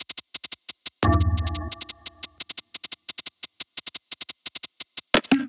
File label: Drums Tops 175 bpm; MIDI Clips; Ableton; Factory Packs; Skitter and Step; Jump